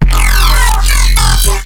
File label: Plasma